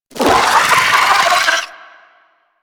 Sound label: Amalgam Moa Satyr Vocal Attack; Warframe